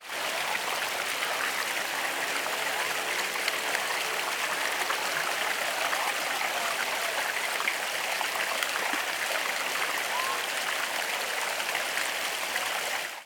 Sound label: Fountain Fontaine